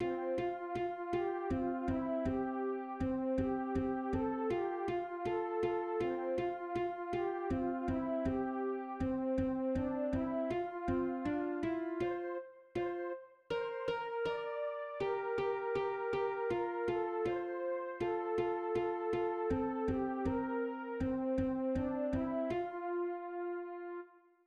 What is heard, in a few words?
midi